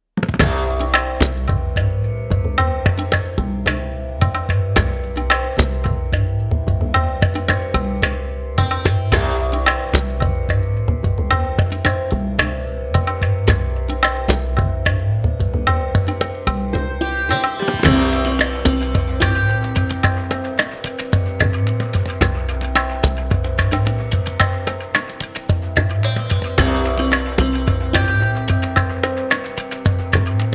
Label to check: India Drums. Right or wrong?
Right